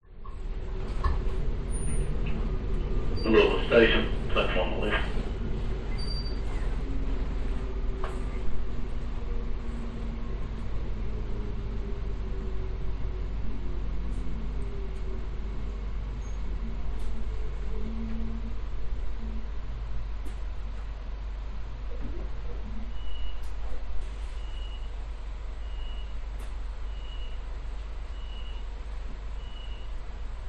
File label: vehicles train internal pov moving slow into stop tannoy start run gangway; gangway connection